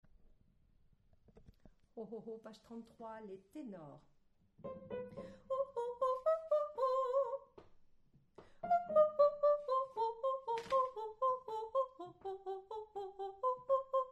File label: tenor